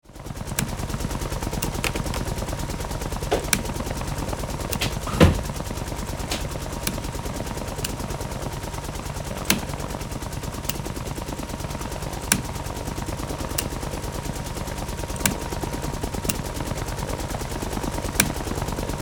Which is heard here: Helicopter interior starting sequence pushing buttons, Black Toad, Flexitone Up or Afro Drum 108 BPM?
Helicopter interior starting sequence pushing buttons